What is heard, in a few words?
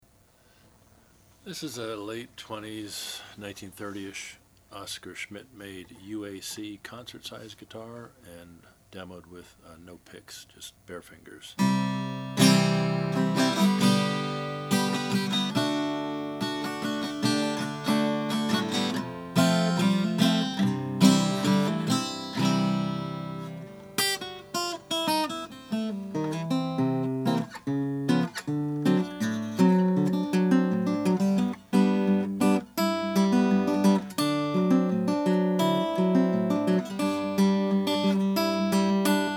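oscar schmidt stella hawaiian sunburst, Guitars; 1930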